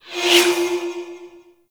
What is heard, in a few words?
Spirit Primary Fire Whizzby; Warframe; Grineer; Thumper Bot; Sentient Spirits